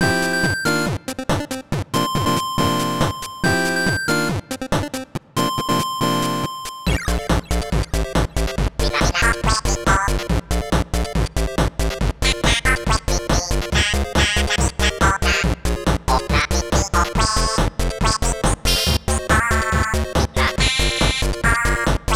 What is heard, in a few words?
Disco NES Remix